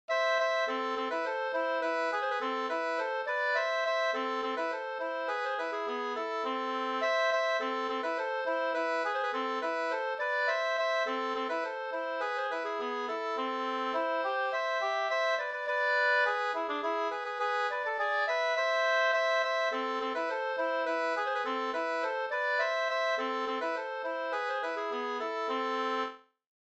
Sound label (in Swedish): slängpolska